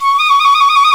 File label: fife trills, British fife